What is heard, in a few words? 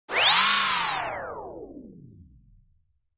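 Brake Electric Beep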